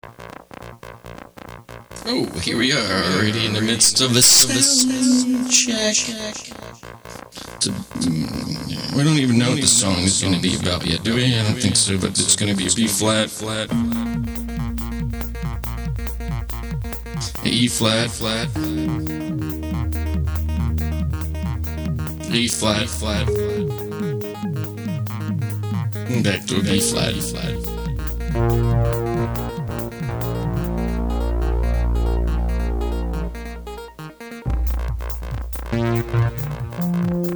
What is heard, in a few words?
soundcheck